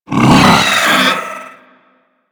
Pet Vocal Pain; Enemies; Vocals; Warframe; Alad V Pet

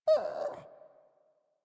Puppy Idle Coo; Enemies; Vocals; Kubrow; Warframe

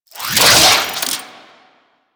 Necramech Repair Drone Idle Movement; Warframe

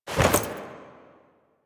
Move Foley Short C; Warframe; The New War; Veso; Quests

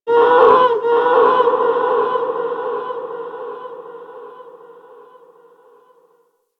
Outpost Creature, Ambience; Warframe; Corpus Outpost

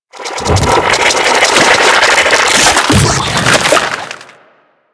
Infested Hive Swell Movement; Ambience; Props; Warframe; Corpus Infested

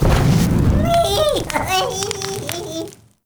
Molten Mote; UI; Motes

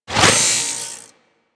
Worker Grinder Whoosh, weapons

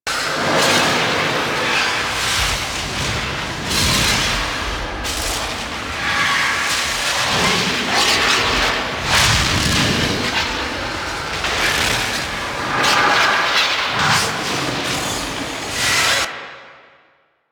Void Sink Loop; Warframe; Void Storm; Railjack

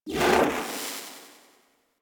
Syndicates Trans Out, UI; DE; Warframe

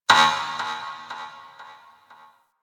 Ship Energy Knock; Ambience; Warframe; Corpus Ship Remaster

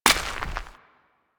Step Dirt, footsteps; Warframe; Grineer Royal Jester; Foley

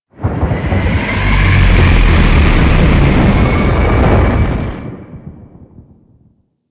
Solar Flare Start; Warframe; Quests; The New War

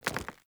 Foley Movement; Characters; Enemy; Mockeries

